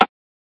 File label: Perc Round; drums; hip hop; STYLE KITS (Hip Hop, Etc); Khezie Hip Hop Kit